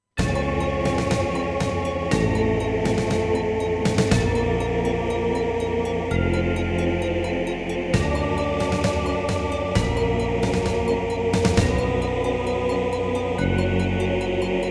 SC880; Dune 2

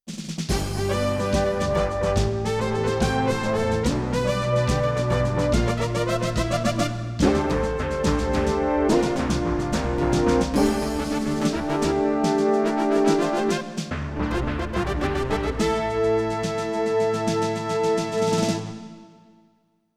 PCI

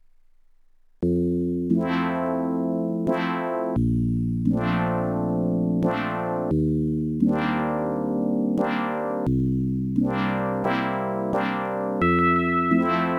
ADLIB OPL2

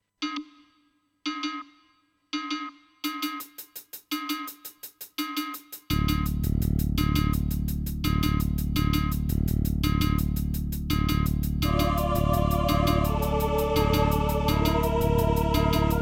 SC880; dune 2 the building of a dynasty

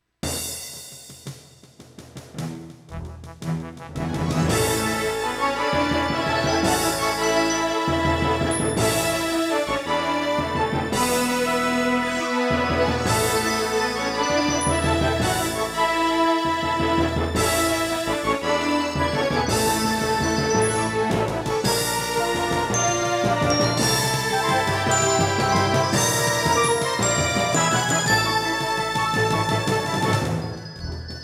SC880; quest for glory 3 wages of war

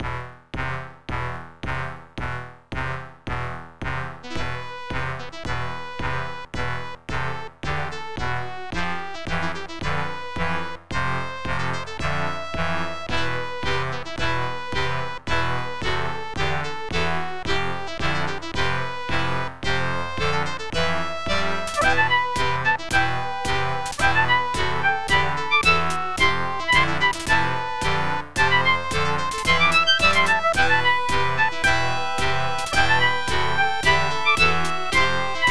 OPL3; Tetris

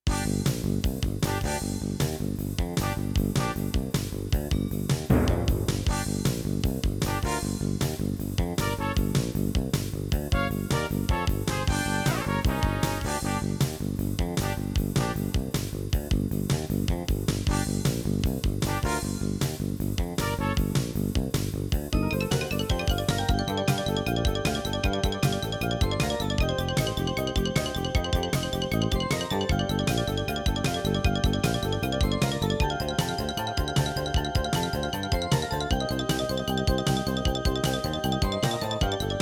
CM500 GM

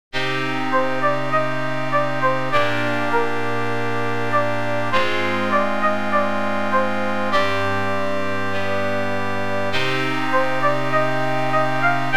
OPL3

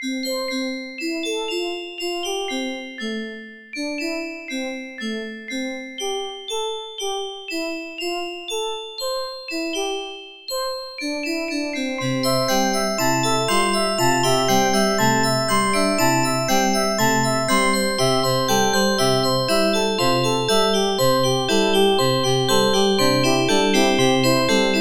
OPL3

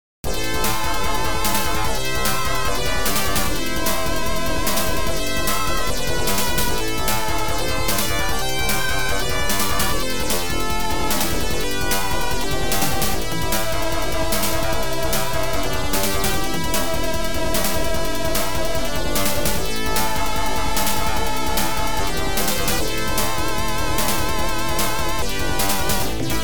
OPL2; Tyrian

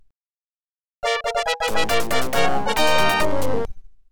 OPL2; Imperial Fighter Destroyed #3; starwars xwing

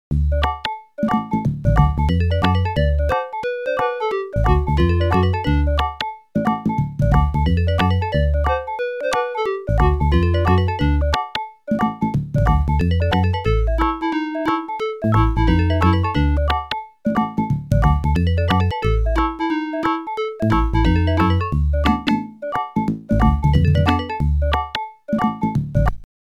OPL2; The Fettucini Brothers

